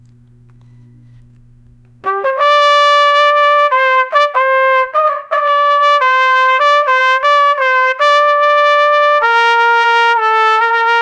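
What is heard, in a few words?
sound of real jazz trumpet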